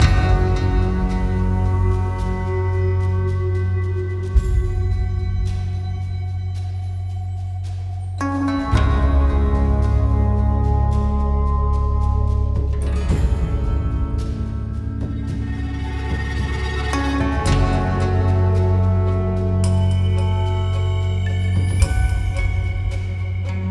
music

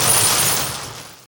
Bird Cage Collision; angry birds rio

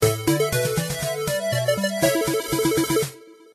bgm fanfare; Dungeon RPG Town of a Stone; android game music

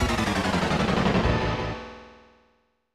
Unused Jingle; SD F-1 Grand Prix; snes

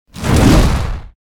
Fire Ball; Idle Champions of the Forgotten Realms; Wizard

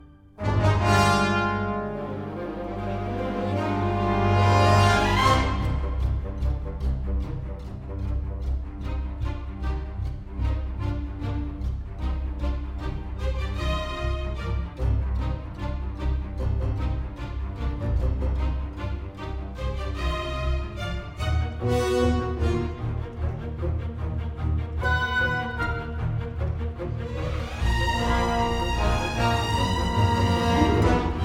Battle Light Layer; The Dwarves 2016 ps4 gamerip

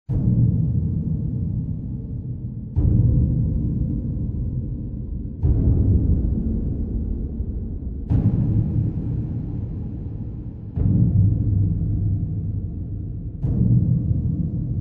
Ending Walk Footsteps

